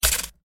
Electric Switch ON

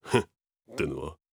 laugh

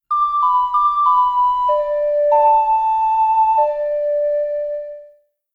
Ocarina

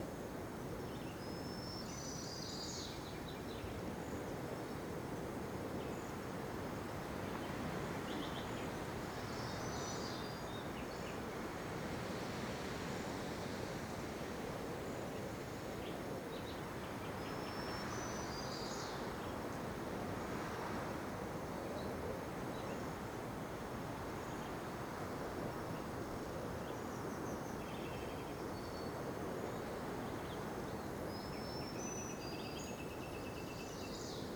amb luncheon

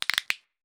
Victory Knuckles; portal quest android; Antihero

